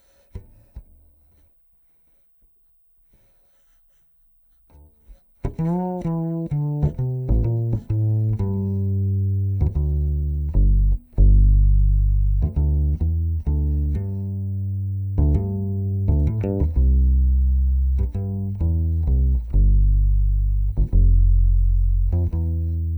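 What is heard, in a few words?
Contrabass DI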